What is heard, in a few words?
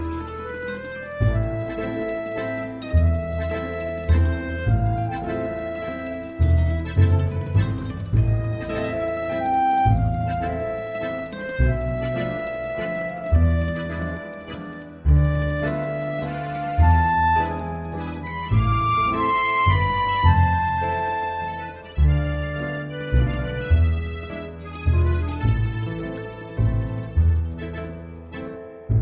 Sicilian Medley